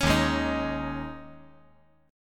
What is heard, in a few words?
Eb 7th Suspended 4th; Eb7sus4; strummed; 6,3,4,6; JGuitar